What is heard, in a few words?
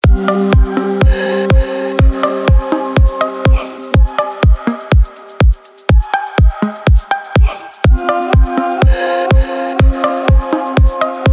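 BASS CLUB car audio loud front; Gromkij front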